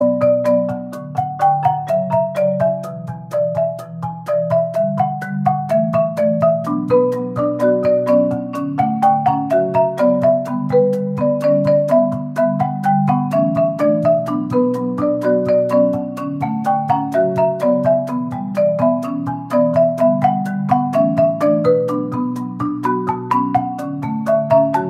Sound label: Marimba cover